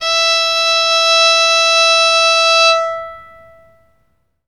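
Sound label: reference pitch fiddle e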